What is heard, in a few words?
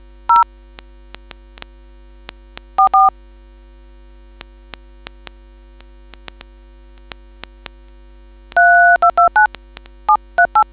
dtmf